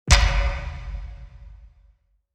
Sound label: start game; Devour